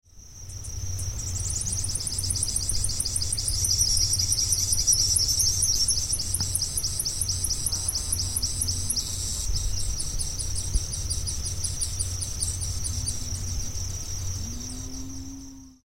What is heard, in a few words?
Sicalis luteola Tipio